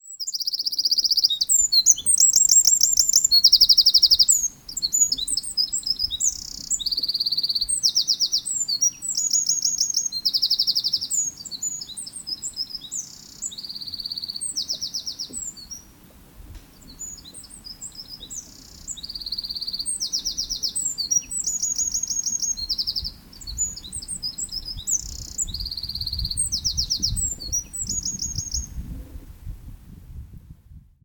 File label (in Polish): strzyzyk troglodytes glosy ptakow